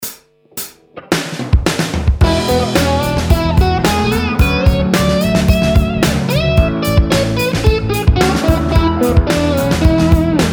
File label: slide, mix